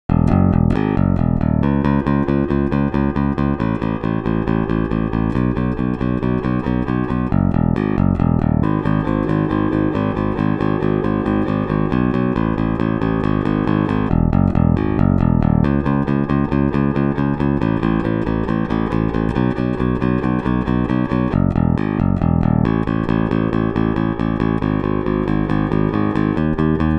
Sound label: Bass DI Original